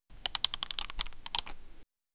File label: player taking damage